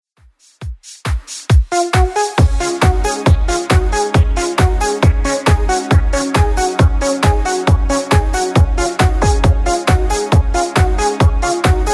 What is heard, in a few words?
90s, 136bpm; Redrum